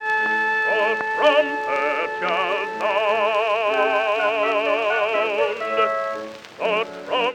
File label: The trumpet shall sound; Messiah; loc-edison